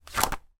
foley notepad front laminated page turn single

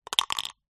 leisure dice into plastic shaker

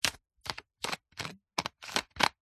foley bottle plastic lid screw or unscrew; zapsplat

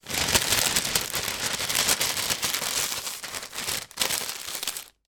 household baking paper roll up; sound effects one

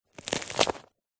food bread baguette tear crispy; zapsplat

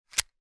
gun bersa; still north media

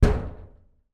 impact metal panel door resonate

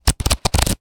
money notes bills wad flick through; zapsplat

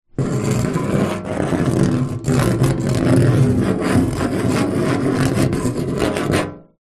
metal object scratch rusty metal surface; foley

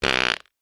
human fart short rasp lower pitched

